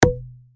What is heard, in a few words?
pop start; Handy